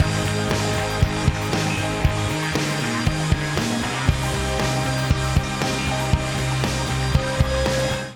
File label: Gtr No Reamp Context